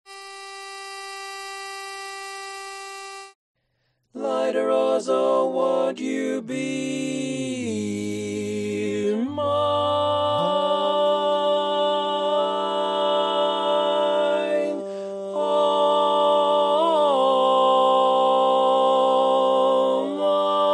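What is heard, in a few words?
Baritone, barbershop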